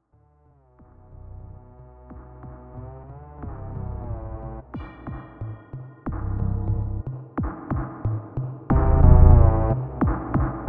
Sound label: lullaby